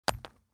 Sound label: Hit Rock With Sledgehammer, Hitting Rock With Sledgehammer; Low , Breaks; Objects